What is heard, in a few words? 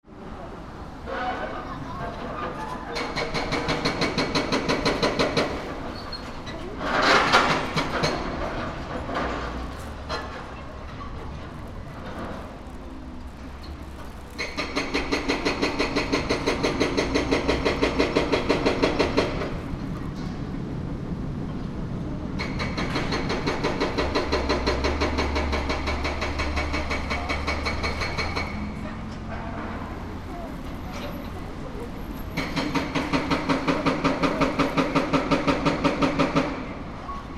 ekimae nakago demolish